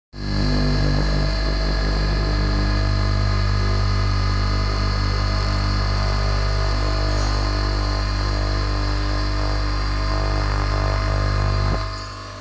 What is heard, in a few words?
bench